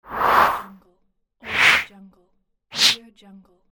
slide sound

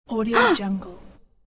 female fright

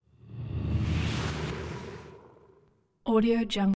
demon vehicle; prosoundfx

